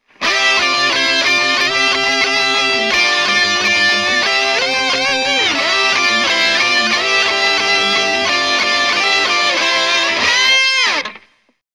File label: Timbre Rock 3 Guitars